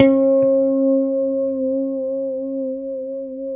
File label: D4 pick harmonics, guitars; Spectrasonics; Hans Zimmer Guitars VOL-1; JC-HARMONICS